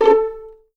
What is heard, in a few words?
A4 PIZ F, VLNS PIZZ; East West; The Ultimate String Collection